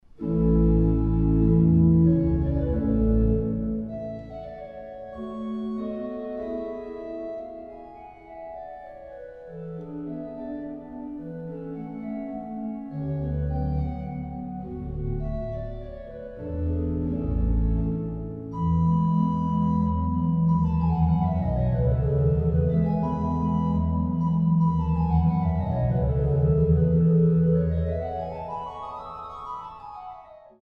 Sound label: Menuetto, orgel